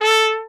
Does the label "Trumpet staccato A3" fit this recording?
Yes